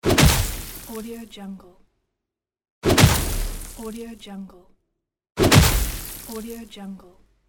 ice kick; game

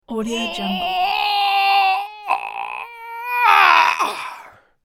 strongman heavy pushing yell

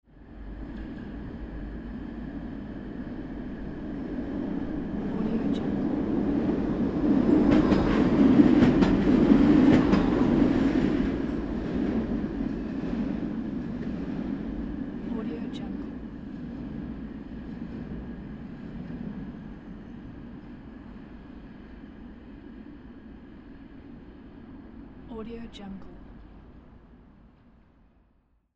tram passing by through a bridge; urban sounds

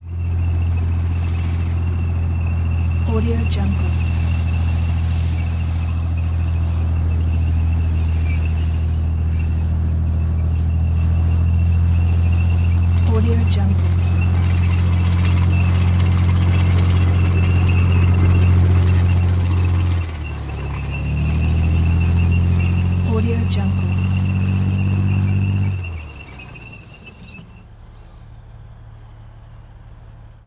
military tanks tank ride interior squeaks stop; game

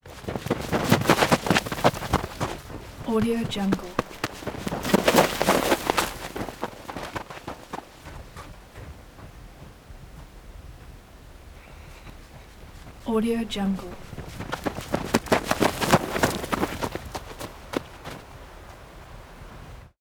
run in and by; فرار کردن; human sounds

